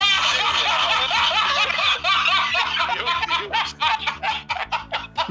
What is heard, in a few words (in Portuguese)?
risada descontrolada